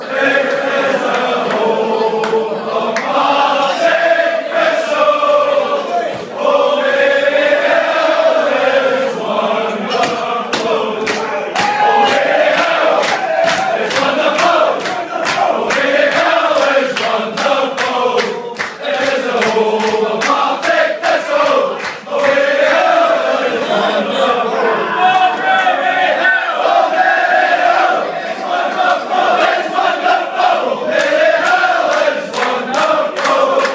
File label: fan chants; maryhill is wonderful